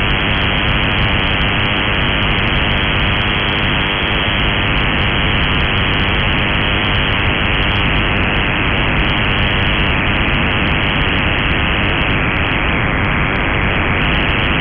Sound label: DSL broadband modem on 21 MHz